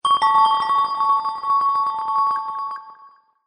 glassy ringtone

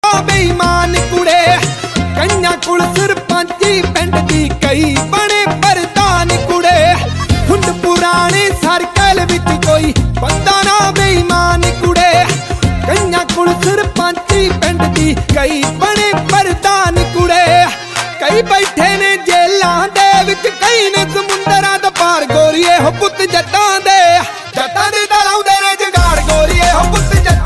punjabi song ringtone

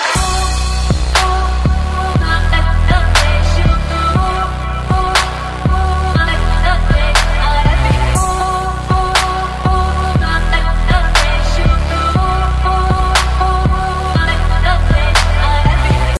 arabic trap ringtone

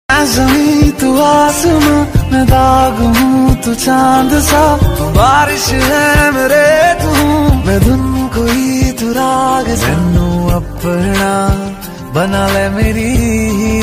new hindi ringtone

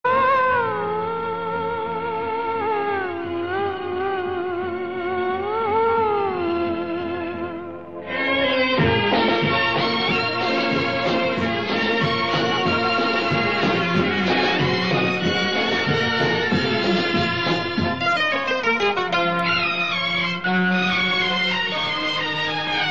sad romantic android ringtone

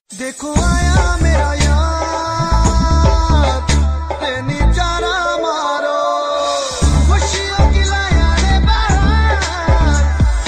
ringtone

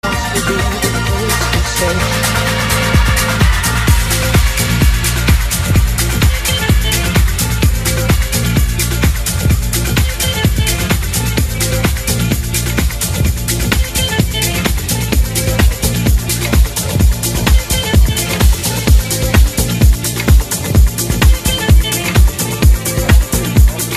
trance ringtone; tomorrowland

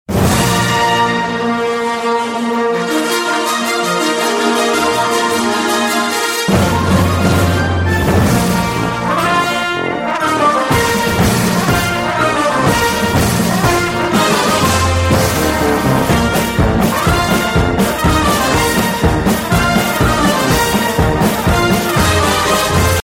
huawei mate 20 x ringtone

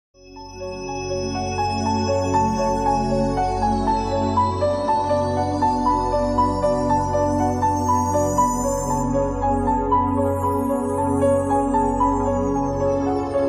track piano ringtone

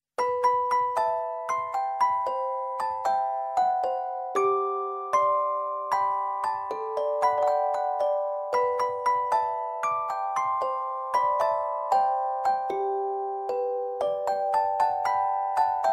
soft romantic iphone6 tone ringtone

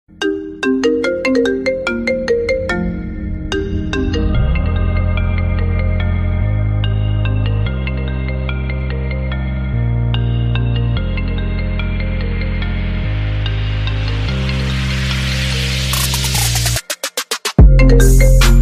iphone 11 pro original ringtone

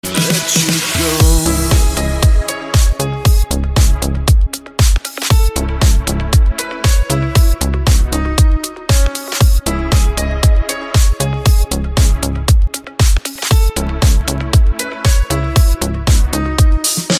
unlisted iphone7 ringtone

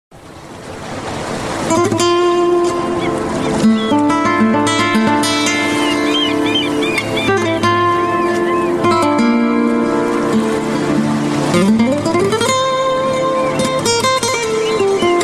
apple romantic sea ringtone

